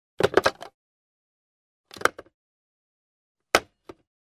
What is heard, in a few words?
Telephone receiver is picked up, hung up